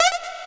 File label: Synth Shot A; Mutekki Media; Vengeance Electroshock Vol.2